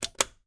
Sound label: BUTTON Click Jigsaw Faded; stereo; Don't Be Afraid The First Toy